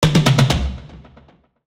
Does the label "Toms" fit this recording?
Yes